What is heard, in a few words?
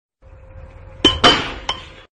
Bottle hit